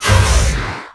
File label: teleportation; cstrike; sfx